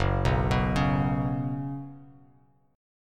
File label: Gb Suspended 4th, Bass Standard; arpeggiated; 2,2,9,6; JGuitar